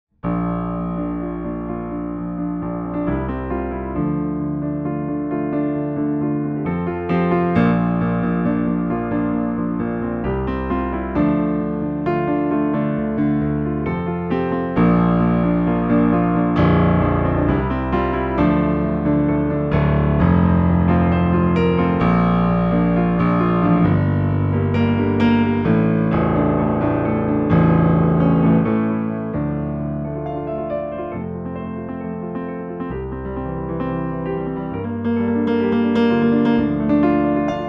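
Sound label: Custom Grand, Pianoteq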